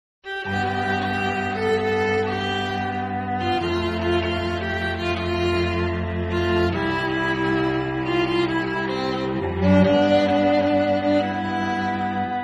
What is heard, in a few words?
stringquartet